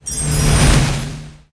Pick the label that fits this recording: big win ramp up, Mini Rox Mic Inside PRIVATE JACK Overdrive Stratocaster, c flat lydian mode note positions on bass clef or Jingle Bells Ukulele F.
big win ramp up